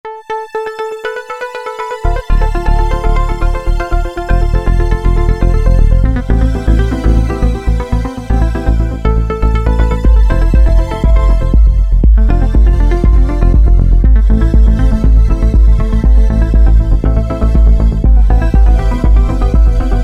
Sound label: Easy Beat; Factory Setups